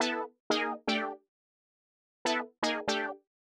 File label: 120 Stabs B, Keys, Nu Jazz House; PowerFX